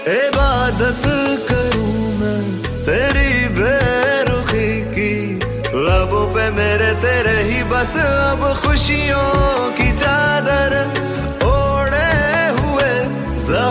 drama ringtone; ibadat ishqelaa; hum tv

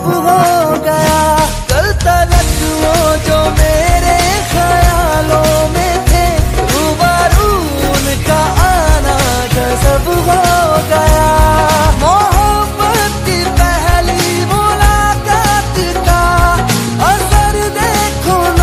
instrumental flute ringtone, bollywood

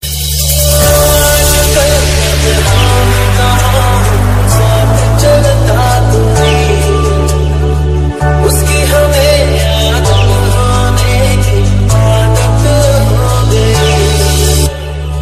indian flute bansuri ringtone

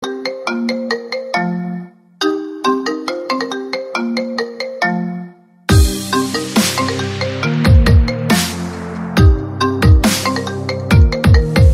i phone metro gnome remix oneplus 3 ringtone

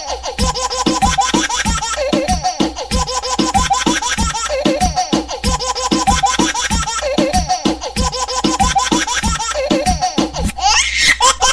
sweet funny ringtone; funny ringtones

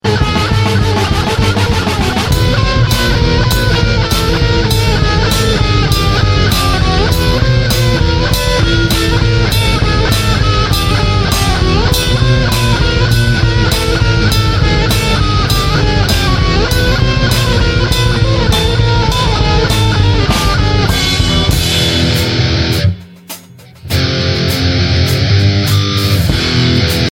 heavy metal ringtone

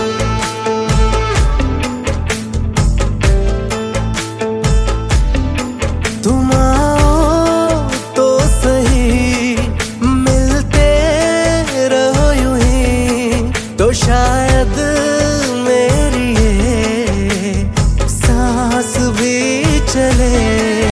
hindi ringtone

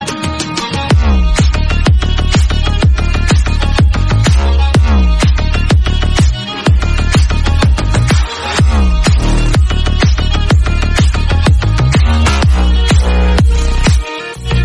hollywood movie theme tone ringtone; samsung ringtones

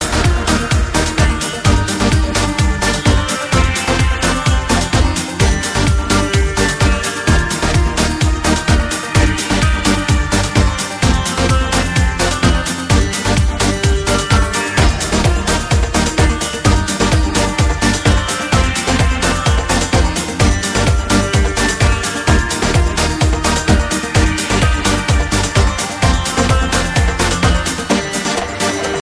ringtone

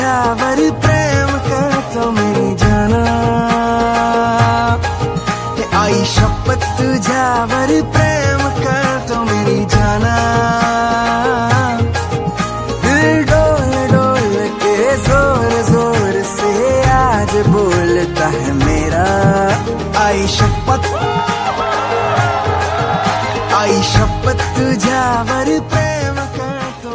cool music ringtone

